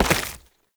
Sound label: Step Flesh, Foley; Warframe; Oraxia